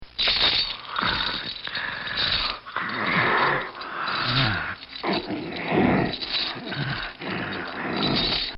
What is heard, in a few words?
Demented Man Dragging Chains